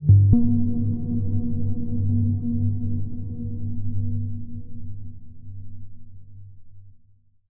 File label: editor blips; Source Engine; Portal 2